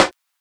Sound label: snare; dough-samples; eddyflux